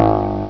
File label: flunk